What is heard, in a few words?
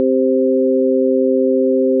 chord